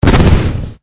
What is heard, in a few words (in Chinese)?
baozha; feiji